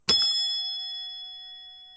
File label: ding